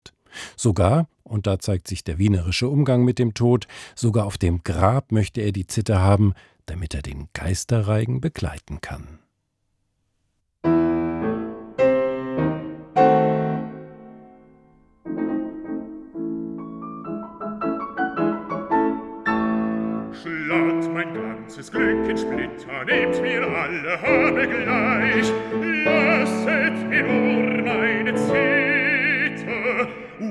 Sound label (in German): Vokalmusik